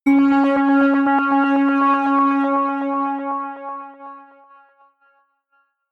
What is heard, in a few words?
synth hit rhythmic scatter high, hits impacts; whooshes rises